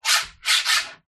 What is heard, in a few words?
coin slide surface